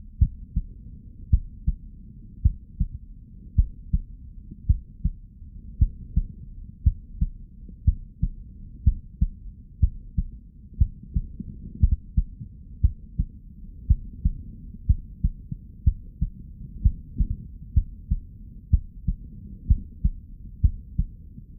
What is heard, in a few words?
Stethoscope Chest Heart Heartbeat Blood Flow; ShapingWaves